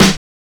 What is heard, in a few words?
Snare, Drum and Bass; EZ Rollers; Instrument Samples